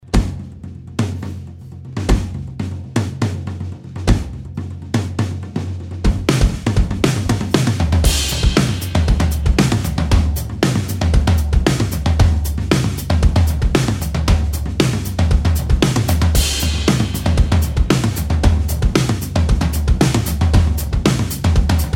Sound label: big beat